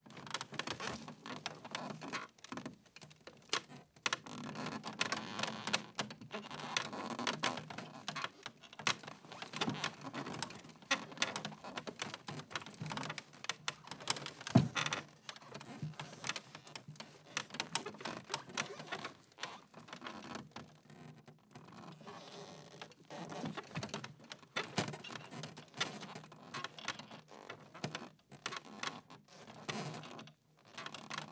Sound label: Wood Creak Suspension; Fairlight Sound Lib